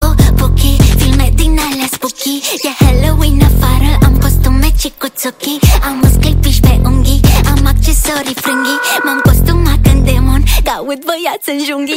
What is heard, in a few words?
boohoo